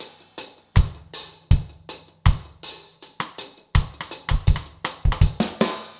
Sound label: reggae